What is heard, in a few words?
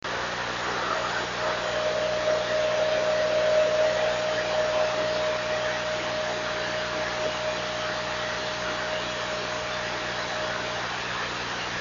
26630KHz